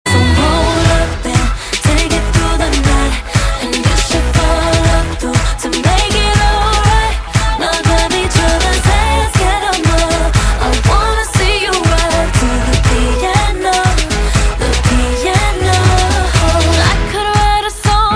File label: piano